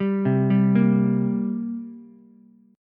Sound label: G Minor Double Flat 5th, down, Ukulele; arpeggiated; JGuitar